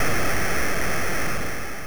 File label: missile